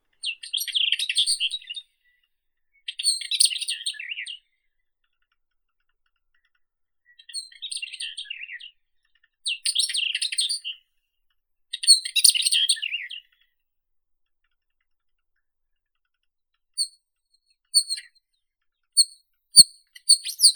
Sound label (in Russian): kamenka obiknovennaya oenanthe oenanthe